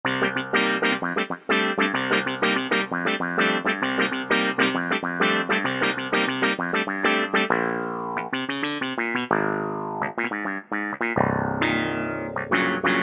HONNER CLAV; Universal Sound Bank; Soundscan 09 Old School Keyboards

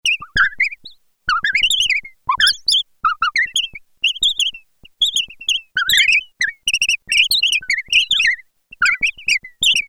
Birds; File 2 Programs

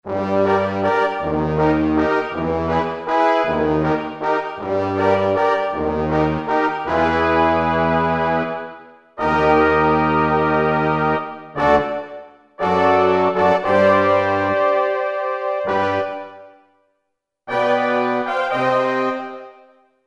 Dyn Lo Brass; Kurzweil K2000 Orchestral ROM

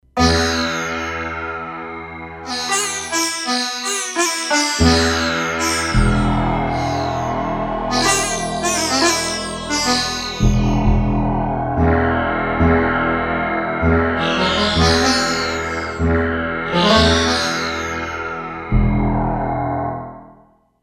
Mod Sitars, E-mu Proteus-3; Factory patches

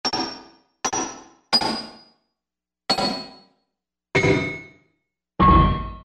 Metal Hit, Korg M1; Factory preset examples

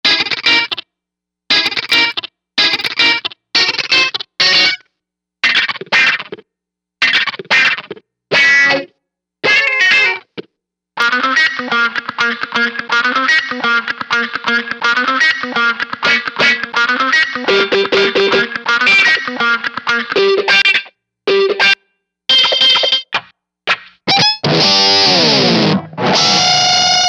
Guitar Stuff

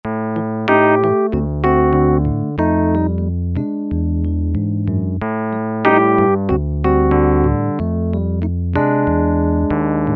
PIANOS and KEYBOARDS Electric Piano; Apple GarageBand 2

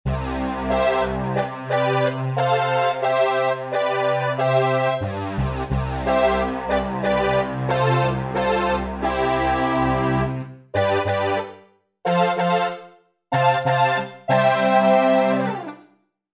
Spit Brs, Roland SRX-07 Ultimate Keys

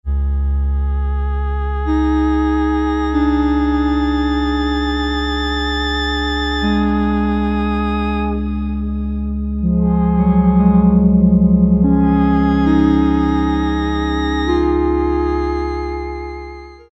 Vox, Cheetah MS6

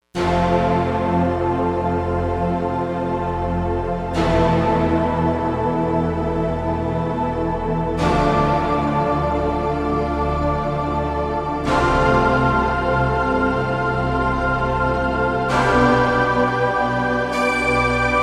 SkyChoir; Digital Synthesizer

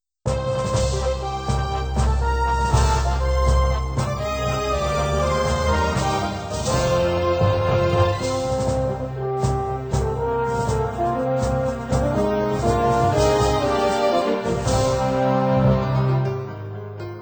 Yamaha PSR-530

